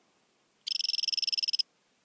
ranitomeya imitator varadero calling

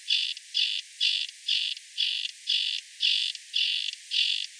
ranitomeya fantastica calling

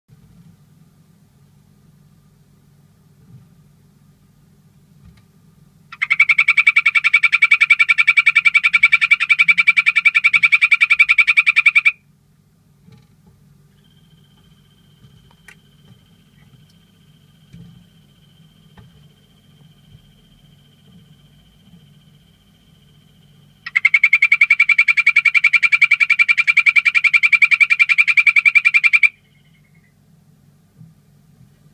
Phyllobates Terribilis calling

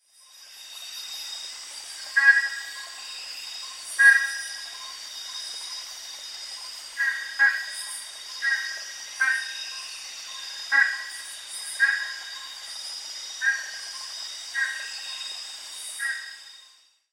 montana calling

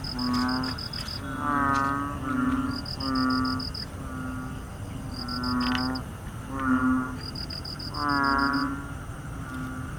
Kaloula pulchra calling

